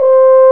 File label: F HORN C4, French Horn